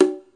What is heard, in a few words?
lo bongo, Latin Percussion; Western and Latin Percussion; single hits